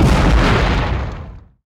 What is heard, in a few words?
Explo Medium; SFX Kit; Destruction